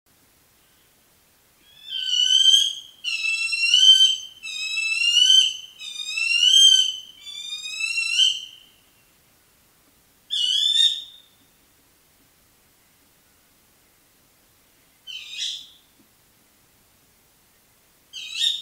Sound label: Tawny Owl Sound